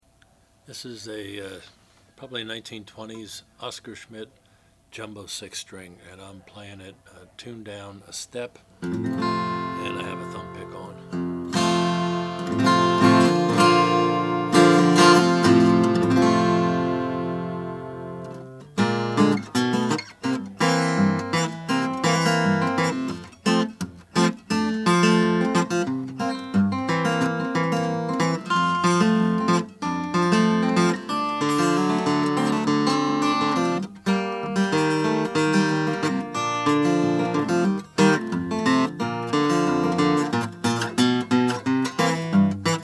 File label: oscar schmidt jumbo flat top; natural